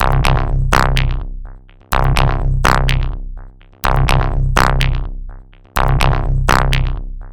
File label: Bass Loop; Shinechilla; Alligator